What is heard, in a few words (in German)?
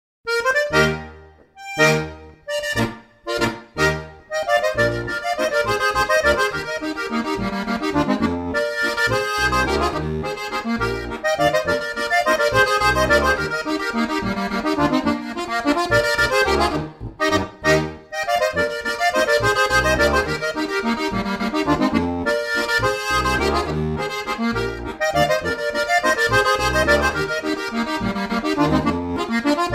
landler; ifinger